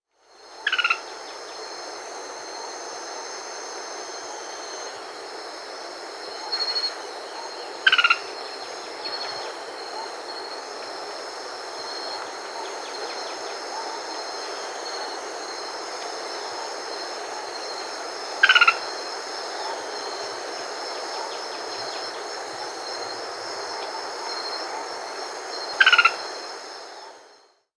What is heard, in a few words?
Rusty-fronted Tody-Flycatcher (Todirostrum latirostre), Peru; S. America